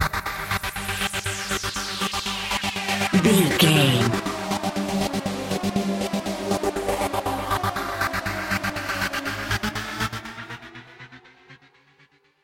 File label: dark dance rising break, Dance, EDM, Club, Eurodance; Exercise; Aerobic; Aerobics; Spinning; Workout; Energetic